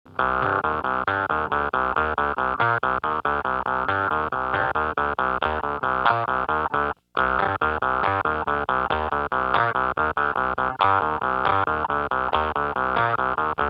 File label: Plucky synth line; The Micro Syntesizer